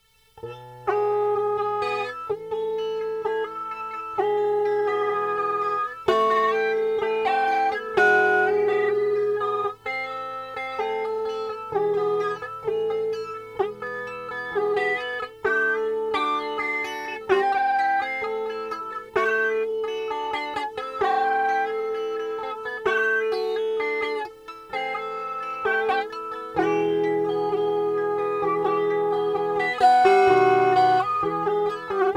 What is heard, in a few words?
flange